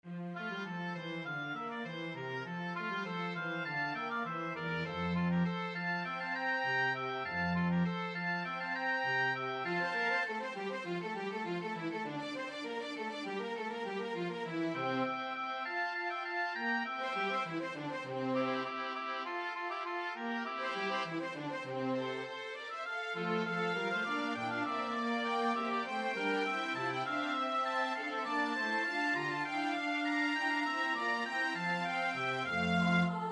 sopraan, koorpartij oefening; Vivaldi (Antonio); Magnificat (RV 610)

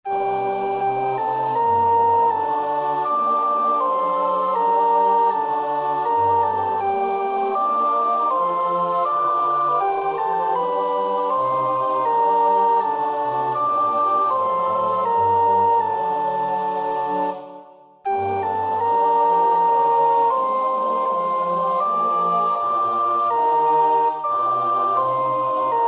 sopraan; Bach (Johann Sebastiaan); BWV 047

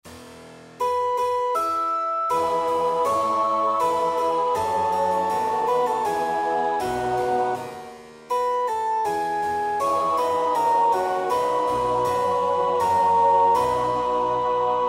sopraan; Bach (Johann Sebastiaan); BWV 008; 6 Herrscher über Tod und Leben

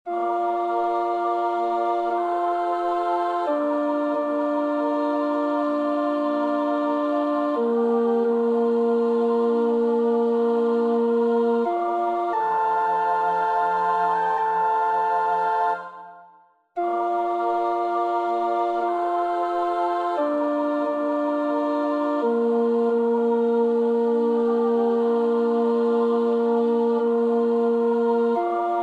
Alt 1, koorpartij-oefening; Wagner (Richard); Senta's Ballade

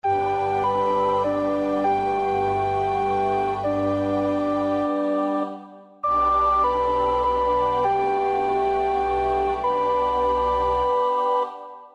sopraan, koorpartij oefening; Pärt (Arvo); Berliner Messe

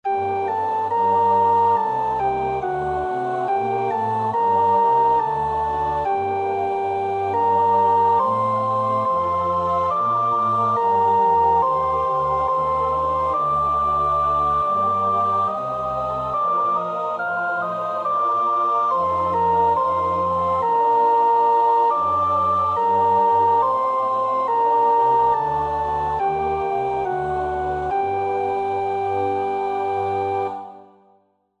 sopraan, koorpartij oefening; Bach (Johann Sebastiaan); BWV 006; 6.Beweis dein Macht, Herr Jesu Christ

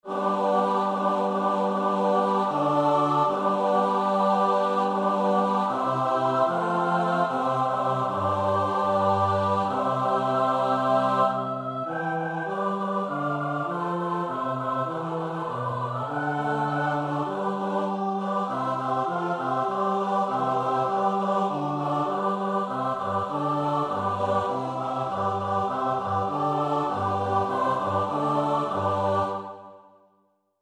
S-A-T-B, koorpartij oefening; Carissimi (Giacomo); Historia di Jephte

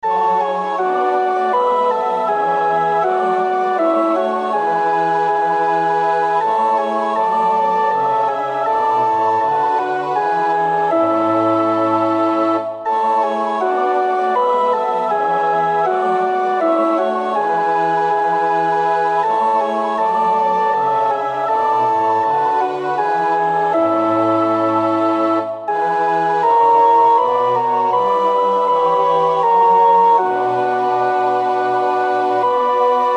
koor 1 en 2 alt, koorpartij oefening; BWV 244 Matthäus Passion

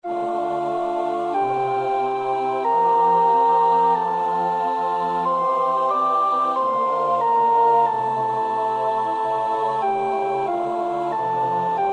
sopraan; Dubra (Rihards)

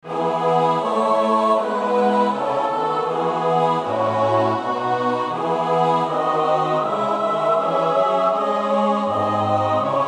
CHORALE koor, koorpartij; BWV 244 Matthäus Passion; No 15. CHORALE- Erkenne mich, mein Hüter (koor 1 en 2)

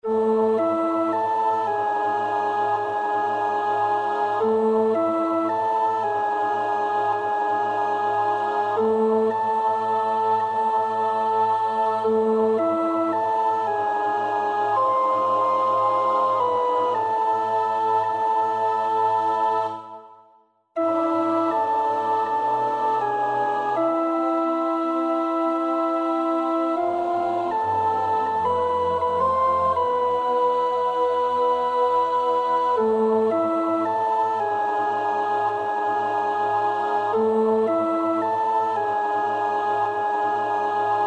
sopraan; Foosnaes (Eva Holm)

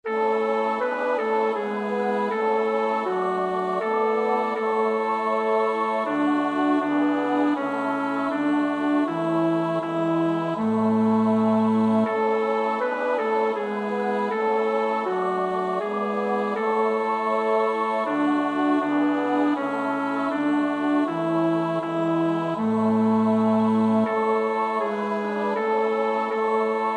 bas; Maunder (John Henry); Bethlehem; Deel 2 (The Kings gift)

